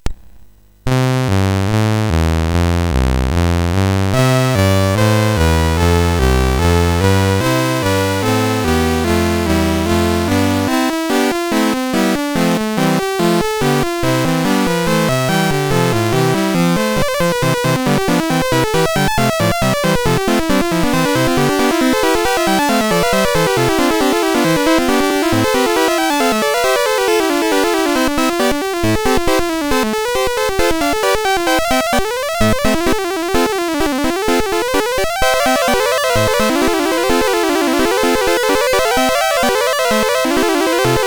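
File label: Paccie, MOS 6581 SID chip music, games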